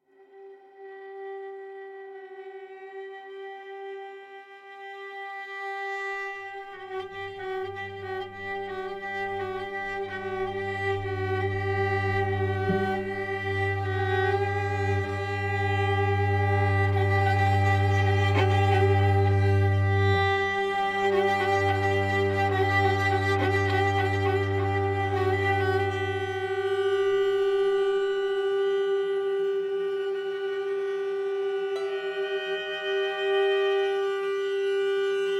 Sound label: Duo for Violin and Cello